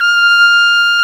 TRUMPETS; Roland - LA Composer vol. 1

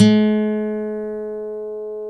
GTR 6-STR, Steel String

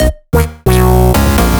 Synth Shots

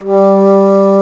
T-FLUTE G2; AKAI S-Series CD-ROM Sound Library VOL-8

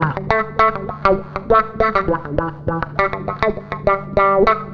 WAH, WAHWAHGTR; USB Soundscan vol.31; Miro s Grooves; AKAI

